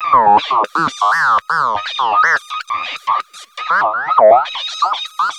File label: Alien Chatter, sci-fi